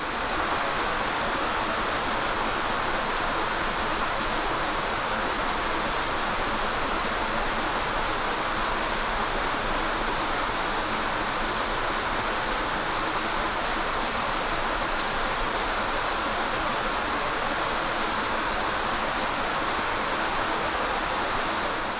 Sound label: rain storm